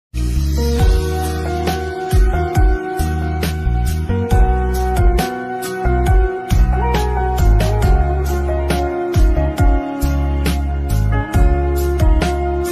broken heart song instrumental ringtone sad music call ringtone